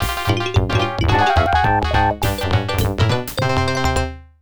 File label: Checkers Win; mario s game gallery dos